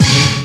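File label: baby; Stabs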